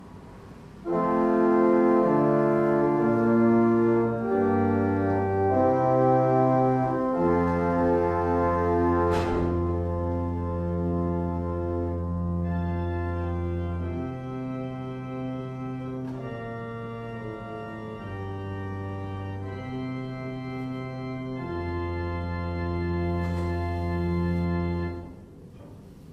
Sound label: Osmond